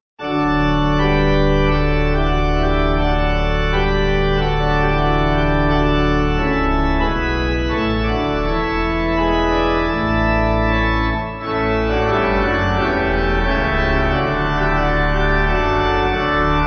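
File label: Pipe Organ; O Thou Joyful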